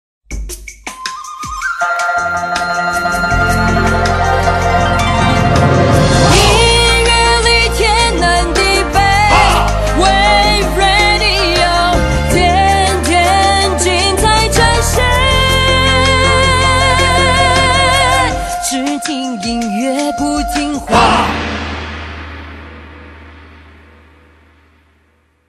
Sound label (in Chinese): 中国风短版